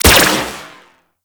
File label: Dispersion Laser Fire; Warframe; Corpus; Riot Moa